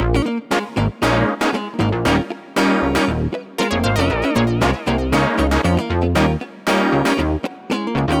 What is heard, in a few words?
Backing, 117bpm Bm; Zero-G; Nu Disco; Construction Kits; That Punch